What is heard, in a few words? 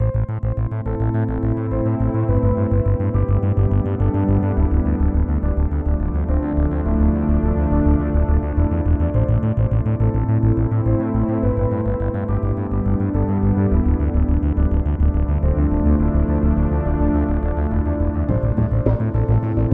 Game Over